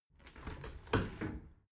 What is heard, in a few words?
window open tilt